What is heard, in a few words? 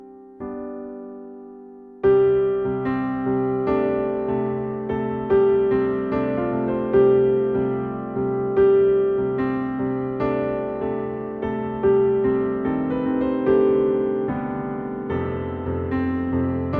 Piano Ringtone; SMO